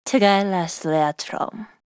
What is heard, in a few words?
spell shout; macha